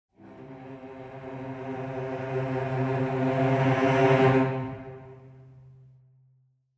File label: Celli Trill Half Tone; Audio Imperia Areia